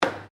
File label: Air Hockey Hit Single, Air Hockey table; Air Hockey (Sound Effects:Games)